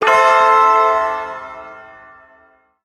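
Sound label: Shawzin Major Chord; Warframe; Duviri Ersatz Shawzin; Chords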